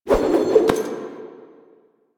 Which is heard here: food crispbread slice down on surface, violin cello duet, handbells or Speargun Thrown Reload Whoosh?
Speargun Thrown Reload Whoosh